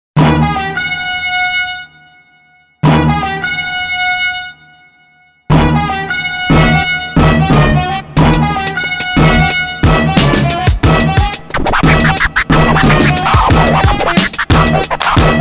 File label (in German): Bondbeat